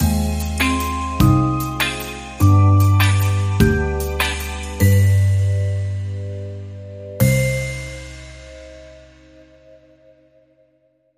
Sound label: Rasta Surprise Sting Mysterious